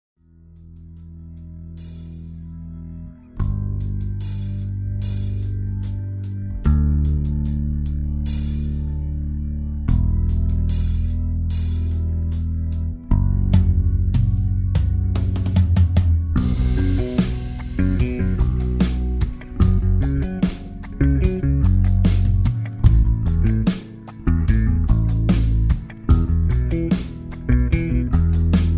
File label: No Vocals No Guitars